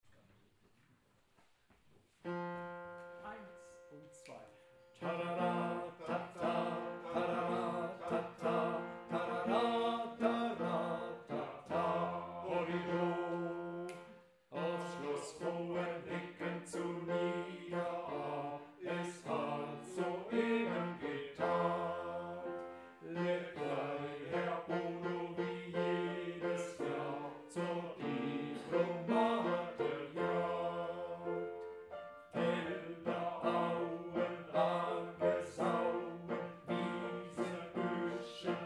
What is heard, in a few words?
bass